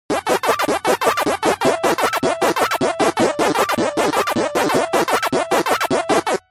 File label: Pacman Waka Waka Xiaomi